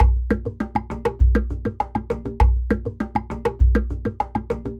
Ensemble, Latin Percussion

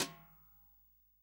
SD, REAL DRUMS, SWING KIT

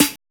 ICE SNARE; Drums In Your FACE!

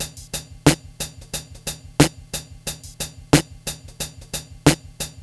90 BEAT, Hip Hop R n B; USB Soundscan vol.19; AKAI

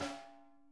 snare tap; Sonic Reality

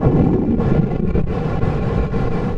NEW BREATH, ORGANIC; USB Soundscan vol.33; AKAI; Disjointed Textures

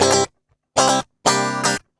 GUI 120 5bC; Best Service Gigapack 2

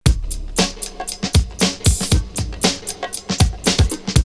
Bongos In Space 117, drumloops OldSchool; X-Static Goldmine

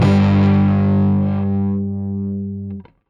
Rickenbacker Bass; Airwindows; Rick-Xtras

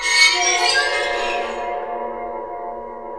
WATERPHONE; WATERFN

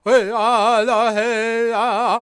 World Voices, Lakota; Spectrasonics Vocal Planet CD5

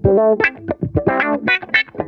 DIZZY, Funk Guitar; 90 s Sample CDs; Zero G

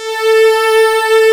STEAM SYNTH; East West; Global Sounds

